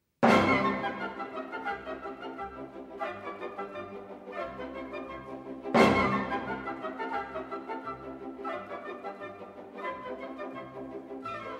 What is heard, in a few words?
party music; Traviata; Opening Act II sc 2